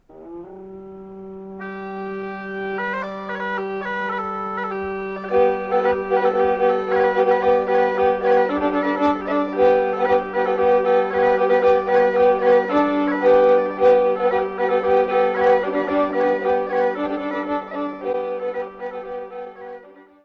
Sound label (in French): Maclote d'Habiemont, Belgique